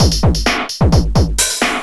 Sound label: shell bpm130, funk